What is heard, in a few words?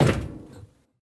metal step; Golden Crayon Sounds